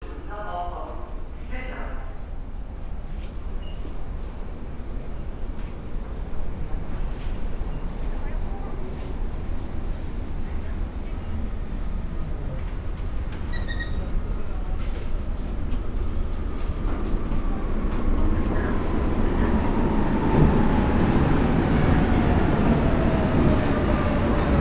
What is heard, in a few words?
U Bahn Berlin; Sound Scapes